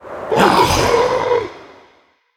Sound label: Snow Ogre Attack